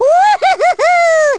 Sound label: baby woo hoo hoo hoo; baby mario